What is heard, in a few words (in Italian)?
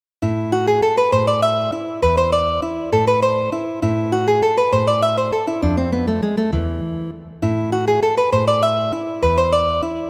valses, guitar